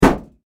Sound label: Impact Auto Hood Sledge Hammer; Hammer Sound Effects; Hammers (Sound Effects:Tools); SFX Bible 6